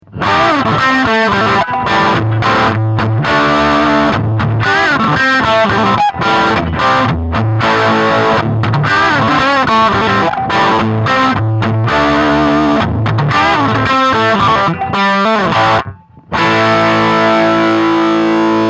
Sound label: Zoom G6